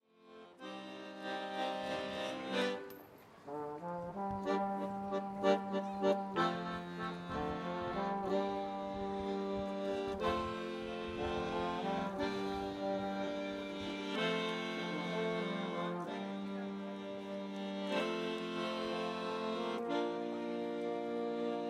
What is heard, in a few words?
street musician; universitatsplatz